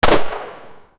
shotgun

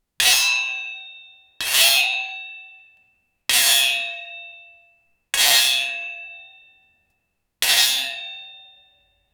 metal sharpening stone; tool

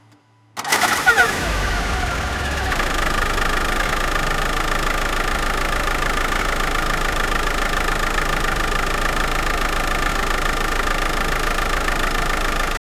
winter cold start of rena, automobile

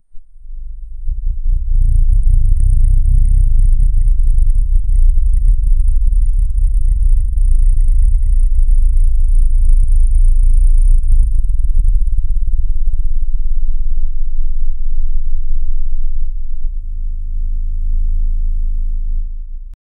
low pitched bending vibra

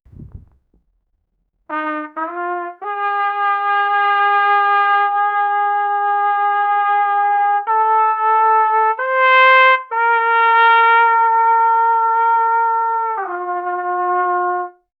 a trumpet playing; brass

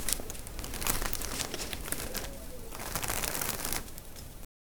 envelope being opened pap; magical shimmer

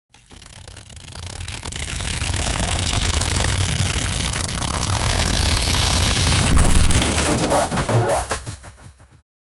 a sharp sudden sound used, cloth

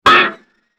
a game sound, wizard

